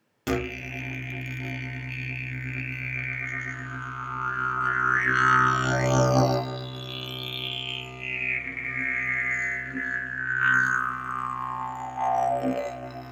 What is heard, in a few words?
didge